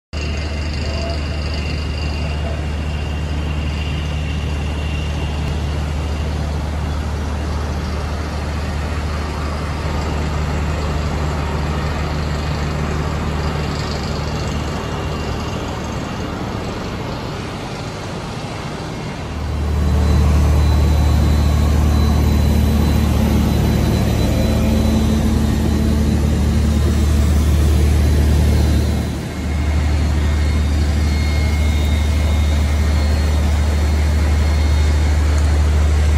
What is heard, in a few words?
PUMP NOISE